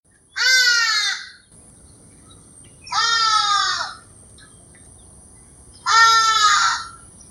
Hadada Ibis Bostrychia hagedash calls Ipoh Perak Malaysia